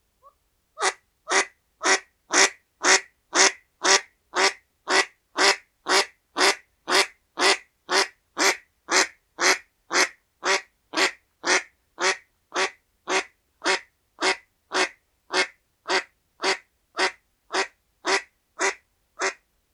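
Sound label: mallard anas platyrhynchos; kryakva; utok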